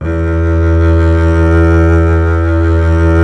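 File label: string contrabass E0; Miroslav Vitous - Solo Instruments VOL-2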